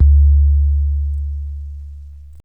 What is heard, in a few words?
dull boom, Kicks Heartbeats